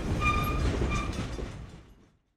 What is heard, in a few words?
Train Stop